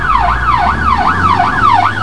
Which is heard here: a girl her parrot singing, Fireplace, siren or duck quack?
siren